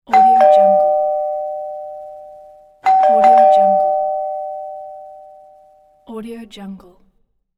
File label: door bell